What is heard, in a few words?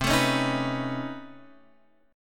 B 9th C down Guitar Standard; B9/C strummed; JGuitar